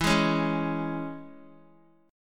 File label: E 6th, down, Guitar; strummed; JGuitar